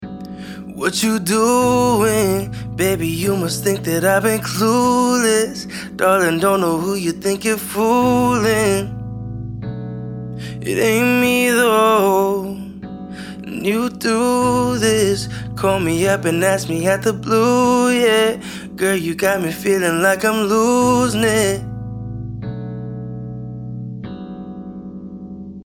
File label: Vocal EP